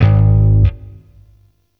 Sentient Shawzin Oct One G Sharp; Warframe

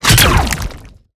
Hand Bomber Proj Fire; Warframe; ManInTheWall

